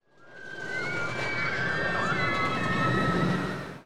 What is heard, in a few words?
scream